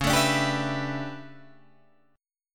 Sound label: A 11th Db, guitar chord